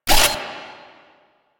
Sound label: Shotgun Quick Reload Layer; Weapons; Warframe; Pax Duviricus